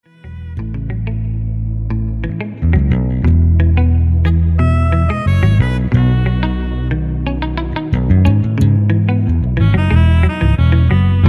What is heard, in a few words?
In Your Hands instrumental